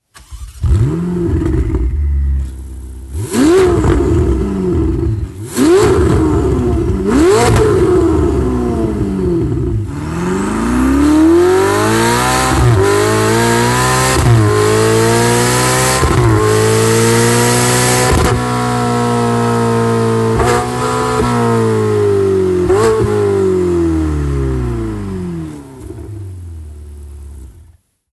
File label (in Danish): Ferrari 488 Italia Slip on udstødning i titanium lyd; Akrapovic